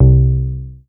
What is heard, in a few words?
C3, BASS; Q Up Arts; Dream Experience; BASS BANK 7